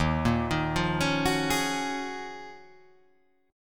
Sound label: D sharp Minor 11th D sharp, chord